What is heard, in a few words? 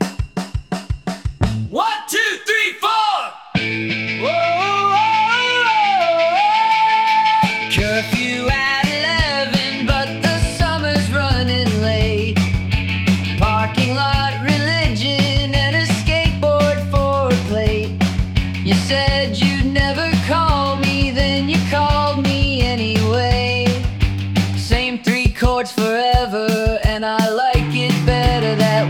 punk; minimax-music3